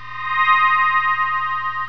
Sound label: Ring; Troubled Souls